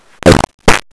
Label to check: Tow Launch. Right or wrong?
Wrong